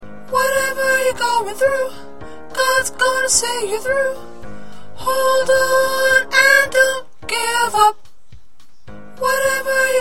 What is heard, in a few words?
Soprano, choir parts